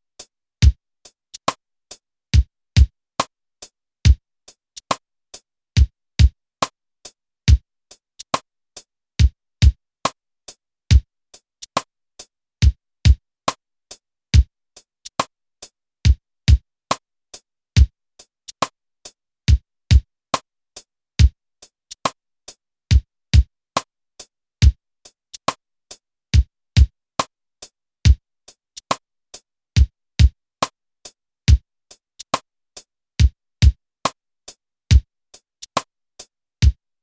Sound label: Pop 70 BPM